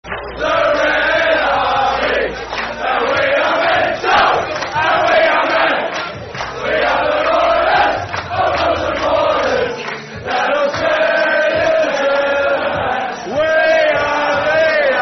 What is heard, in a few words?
We Are The Army, The Red Army [Football Chant (Soccer Song)]; Adelaide United Aufc